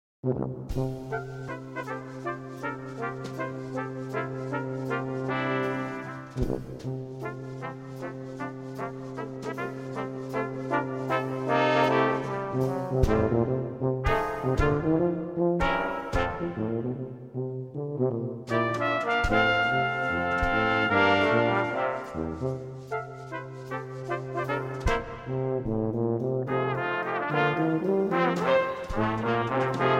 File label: Brass Quintet; Prism Brass Quintet